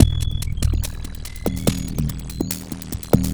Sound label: HOLOGRAPHIC BACKBEAT; FRENETIC FRACTAL GROOVES